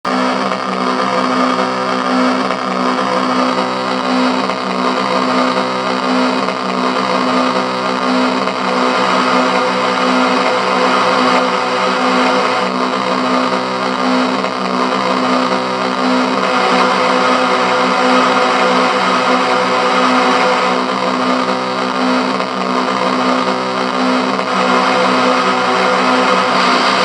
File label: distortion; Suonoio